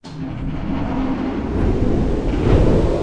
fan on; CounterStrike; cstrike

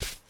sand, walking; factorio; Factorio 0.14.21

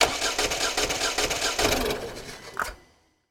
car no fuel; factorio; Factorio 0.14.21; fight

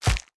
axe meat; factorio; Factorio 0.14.18

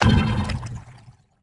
spawner death; factorio; Factorio 0.14.21; creatures

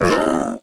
creeper death; factorio; Factorio 0.14.21; creatures

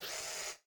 inserter basic; factorio; Factorio 0.14.21

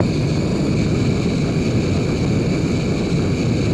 flamethrower mid; factorio; Factorio 0.14.21; fight

